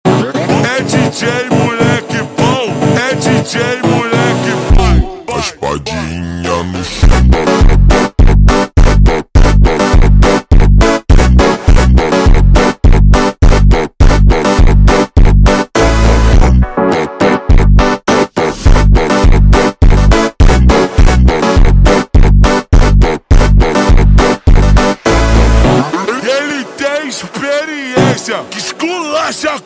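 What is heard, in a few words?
super Slowed, phonk music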